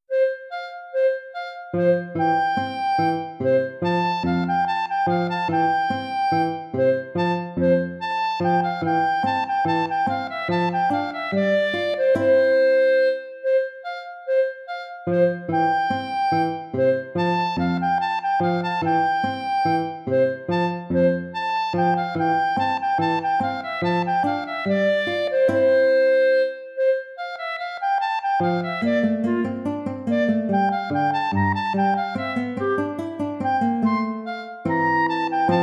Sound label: gavotte; corelli arcangelo